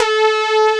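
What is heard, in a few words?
Moa Aggressive Vocal Agree; FUNK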